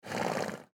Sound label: horse snort; Dora and Friends - Legend of the Lost Horses